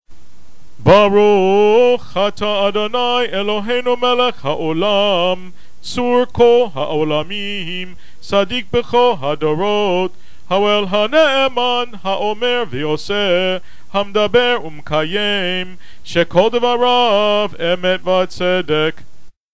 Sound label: haftarah after